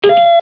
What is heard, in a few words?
Key Press; valve; grimtrek